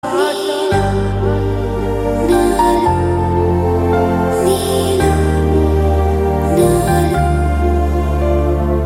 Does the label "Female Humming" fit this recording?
Yes